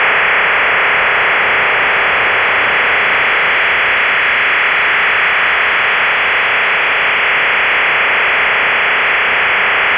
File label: RUS OFDM93, OFDM